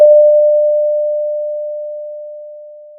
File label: Sound of a church bell